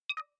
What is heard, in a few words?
error